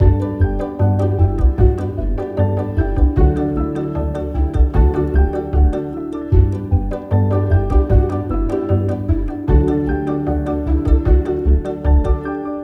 Chilled; Library Chilled; Audio Animals

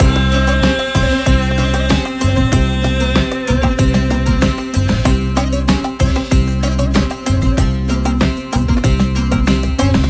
Middle East

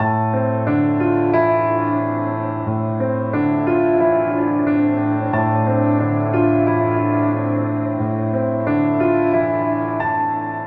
Piano

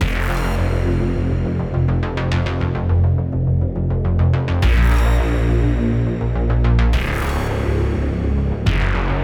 Bass

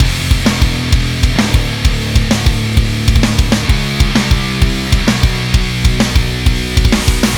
Metal Before

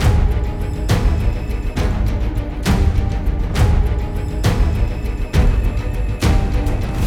Library Fantasy; Audio Animals

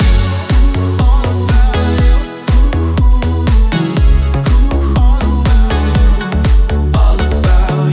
Pop

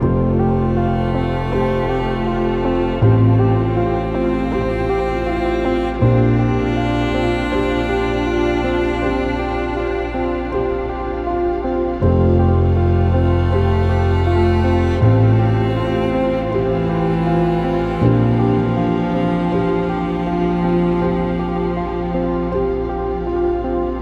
Library Orchestral

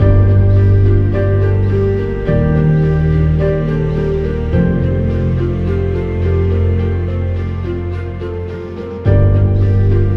Orchestral Dolby Atmos

